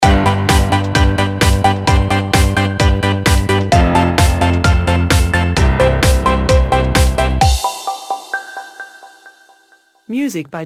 Neon Dance stinger

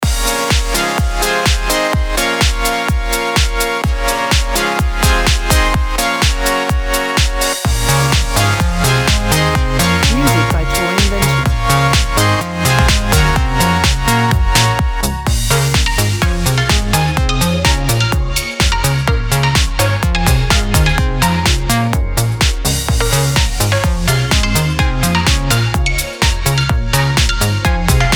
Neon Synths loop

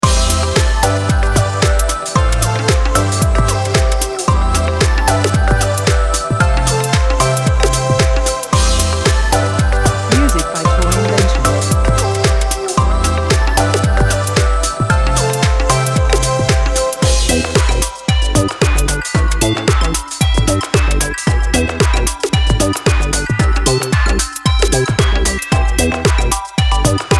Feel Young loop

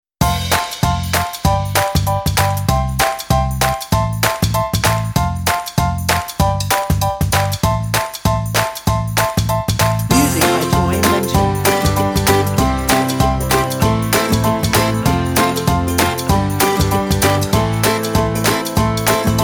Feel Good Trip background

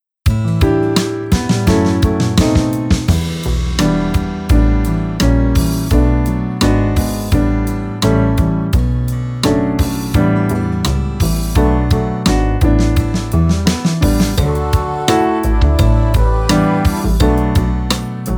Jazzy Advert

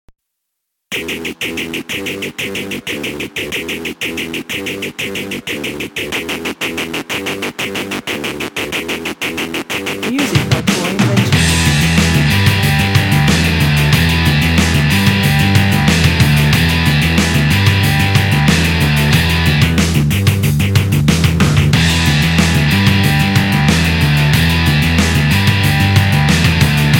Mean Machine no solo guitar

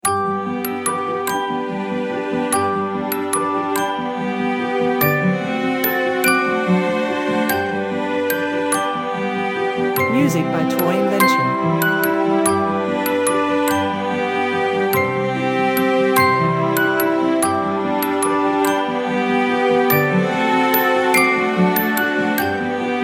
Silent Night Musicbox

